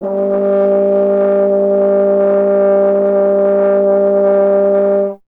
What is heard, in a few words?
F. Horn; Miroslav Vitous; Solo Instruments VOL-2